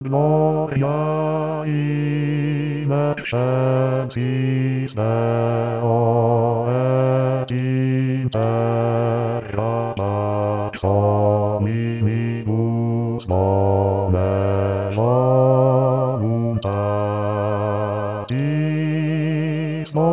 gloria rit bassi; anno liturgico

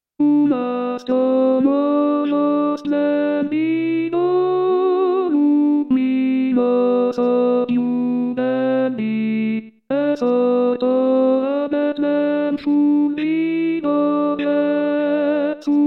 Tenori; natale

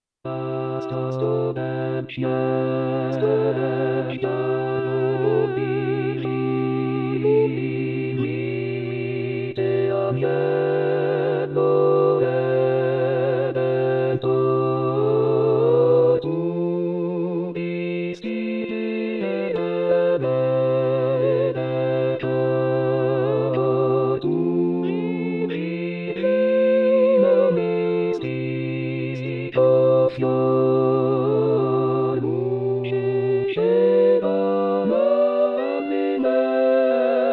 Astro Del Ciel Coro; Gruber; natale

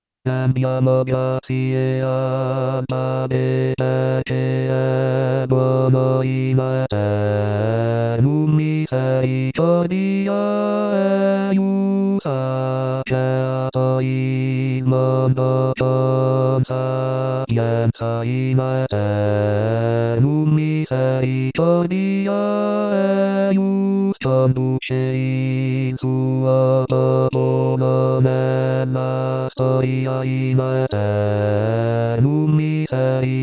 Misericordes Strofe bassi; anno liturgico

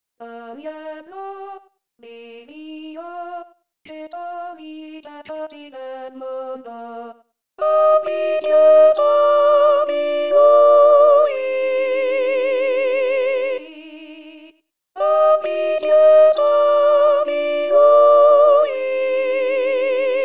Agnello di Dio Tenori; Auricchio; anno liturgico

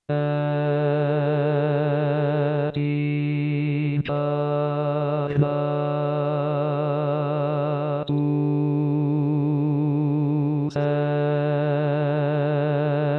Bassi; Et Incarnatus Est; Perosi; natale

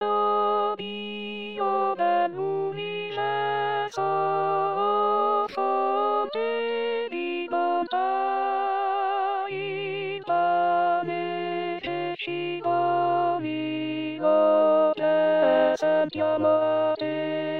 Contralti; O Dio Dell Universo

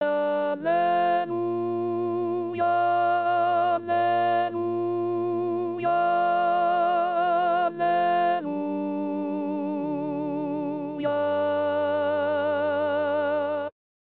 Alleluia Bizantino Contralti

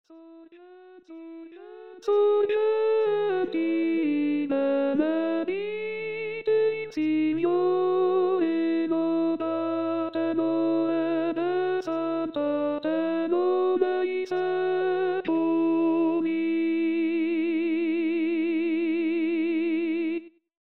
Contralti; Sorgenti Delle Acque; Pasqua

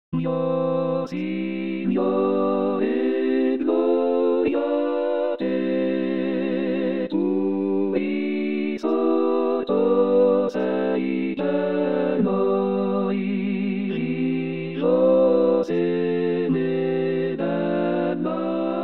Gloria a Te Coro; Mio Signore; Pasqua

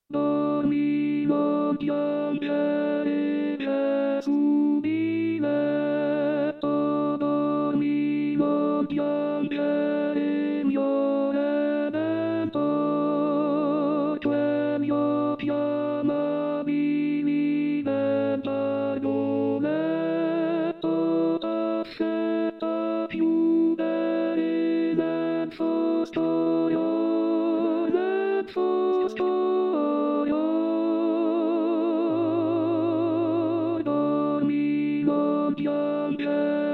Dormi Non Piangere Contralti; natale

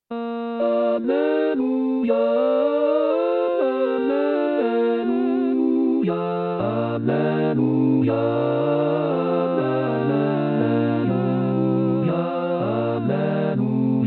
Alleluia coro; Gabrieli; anno liturgico

